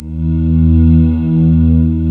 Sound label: VFX VOIC; East West - Global Sounds